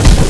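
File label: Wood Ship Hit; Civ4 warlords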